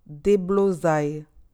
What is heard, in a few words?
uproar